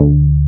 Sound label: bass Croma D 3; Best Service Gigapack 2